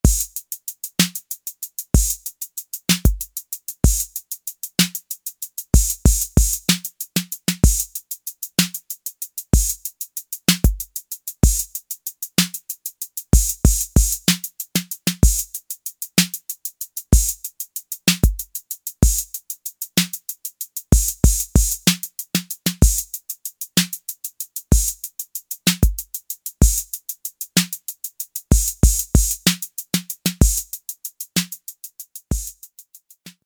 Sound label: ROCK, Roland TR-808; Factory